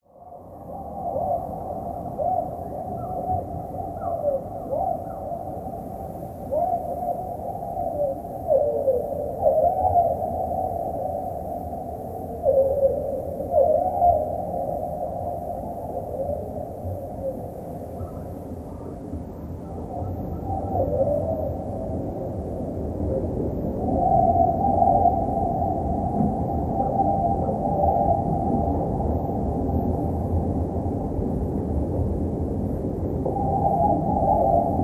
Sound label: Bird Bg Speed Thro